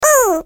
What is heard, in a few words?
slide; Carrot cookie